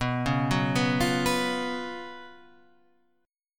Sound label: F sharp Major 7th Suspended 4th, B, chord